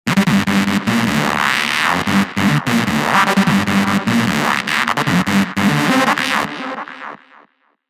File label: notch lead